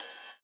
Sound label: ride